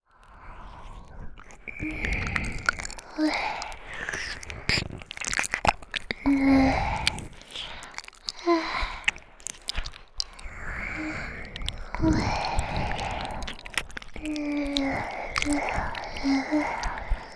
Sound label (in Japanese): ぐりんぐりん 舌の位置が分かる耳舐め; 両耳同時